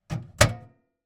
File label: household microwave door close recorded inside; zapsplat